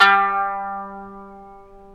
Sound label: ETH KANUN, Eth Plucks, Saz & Kanun; Club-50 - Foundations Roland